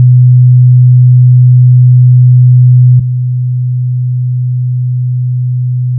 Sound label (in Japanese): 125Hzの音データ/基準音と低減音 [自動車の低音マフラーなど]; ペアガラス対応